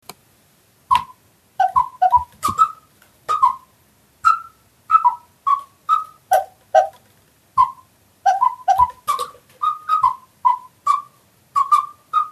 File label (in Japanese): mizu osu tone senro